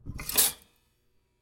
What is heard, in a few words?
Toaster In; soundscrate